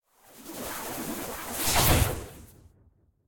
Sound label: Miniboss Transform; super mombo quest